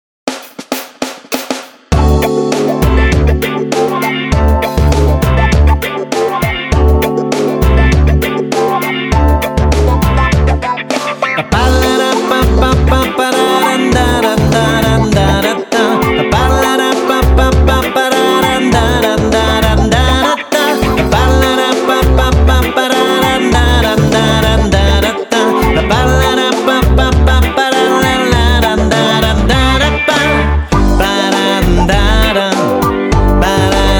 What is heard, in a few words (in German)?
Instrumental unbetextet